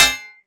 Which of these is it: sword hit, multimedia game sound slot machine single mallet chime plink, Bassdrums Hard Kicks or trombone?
sword hit